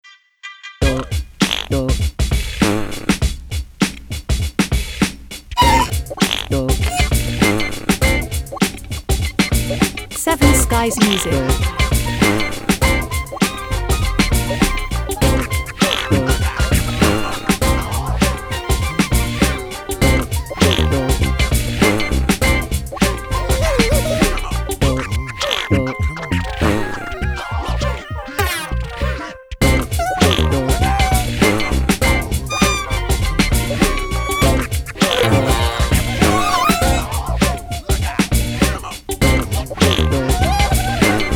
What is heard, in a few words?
funk